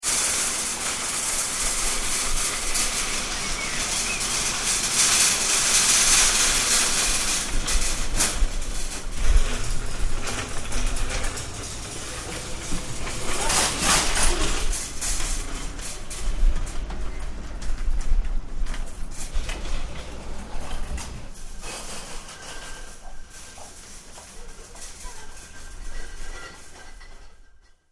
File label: Shopping Trolley, Crashing and wheeling sound of shopping carts outside supermarket; Stores and Malls